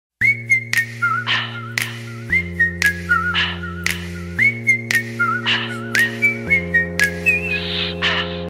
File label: message tone